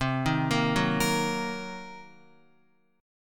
C Minor 7th Flat 5th, Guitar; Cm7b5 arpeggiated; JGuitar

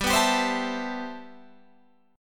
C Major 13th G

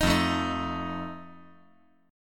F 7th F down

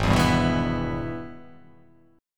Minor Major 7th Sharp 5th; Gsharp; 4,7,5,4,4,6,6,x

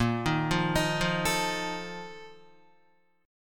A sharp Major 7th Suspended 4th, Guitar; arpeggiated; guitar chord

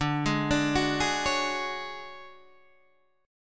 D Major 7th Flat 5th D down Guitar Open D

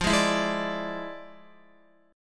F Minor Major 13th, F, Guitar; Listen to FmM13 strummed

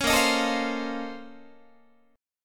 A sharp Minor Major 11th, Guitar; strummed

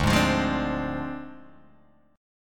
D Minor 7th Flat 5th Guitar Open G

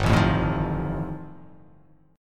G 7th Suspended 2nd Sharp 5th G chord

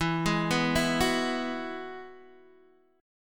E Major Add 9th E, Guitar, DADGAD

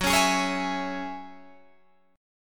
Gb 7th Suspended 2nd Gb Guitar Open D

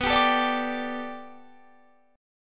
B-Minor 7th Sharp 5th B down; strummed

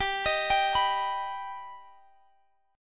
G Augmented G Guitar Standard; x,x,17,20,20,19; arpeggiated; capo=3

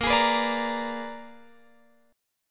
Bb 7th Flat 9th Bb, Guitar Open F; Bb7b9 strummed

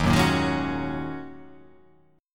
Eb Minor 11th Eb down; Ebm11 strummed; JGuitar

